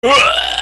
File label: puker